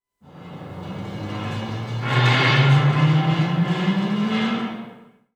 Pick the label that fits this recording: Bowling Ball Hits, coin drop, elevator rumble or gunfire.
elevator rumble